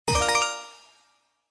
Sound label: Symbol Regular Win; slots; Realm Saga